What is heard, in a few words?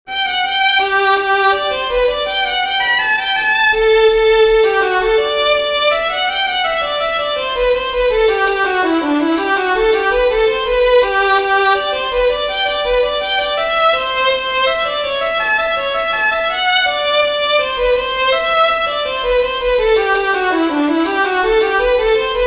HORNPIPE, fiddlers; southern kings fiddlers